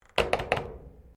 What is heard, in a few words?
Clothes Dryer Door Switch Operate, Domestic dryer; Laundry; Household